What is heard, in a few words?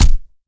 body; Original TK Sounds